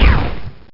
blaster

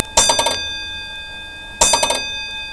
dinglind

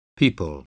people

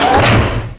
MINES; Hell; PC Gamer 36

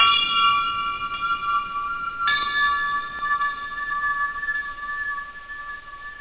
ting; da capo vol1; drums nature

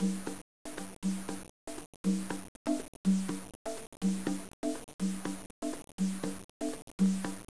samba; 12000 Graphics Pack - A Complete Graphics Collection For Your PC

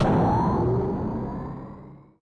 Zoom; Atomix 2004

